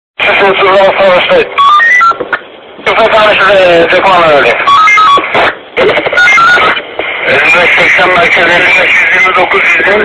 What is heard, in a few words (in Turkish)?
polis siren sesi